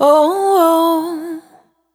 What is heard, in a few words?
Single Voice Melodic Loops; Singles